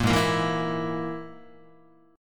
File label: G sharp Suspended 2nd Sharp 5th, Guitar; G#sus2#5; strummed; JGuitar